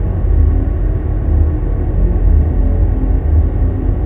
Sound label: planetary pod, ambiances; CosmicRage